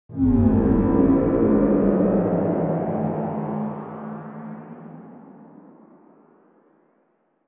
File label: ufo; WMBonusXP